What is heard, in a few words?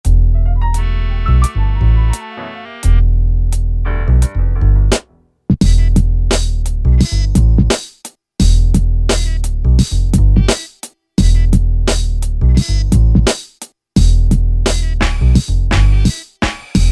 Acid Jazz; Yamaha DJX; Styles